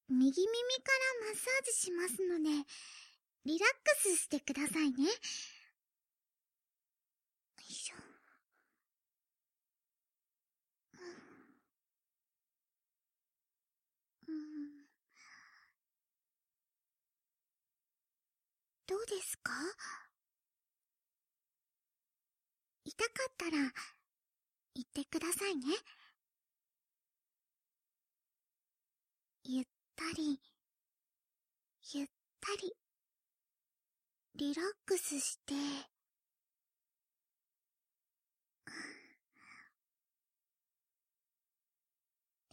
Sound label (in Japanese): 右耳マッサージ（効果音なし）; 見習い天使が頑張るあなたに癒しをお届け; かしまし☆とらいふる